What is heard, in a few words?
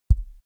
microphone pop on soft; objekte; elektronik